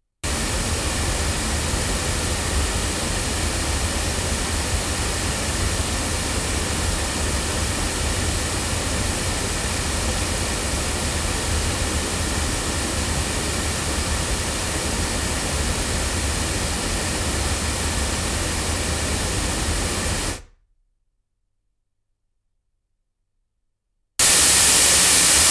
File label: Golden Age TC1 cardioid loudspeakers